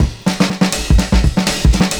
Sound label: CYMB, Breakbeats; USB Soundscan vol.46; AKAI